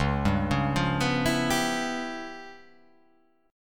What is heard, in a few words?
C sharp 7th Sharp 9th Flat 5th, chord